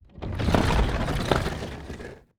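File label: siege initial movement; Onager, Ballista, Ram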